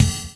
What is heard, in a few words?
Jazz Break